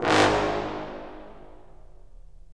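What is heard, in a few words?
brass cimb, Roland; LA Composer; Roland LA Composer vol. 4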